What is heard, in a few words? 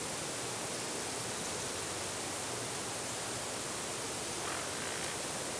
wind trees ambience; Star Wars Obi Wan; XBOX